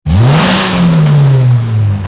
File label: car engine revving